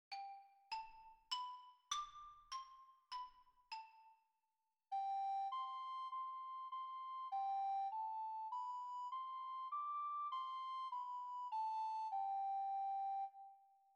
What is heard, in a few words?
Playing C, recorder